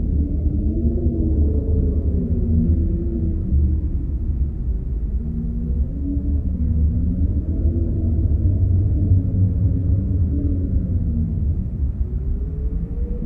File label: Wind mine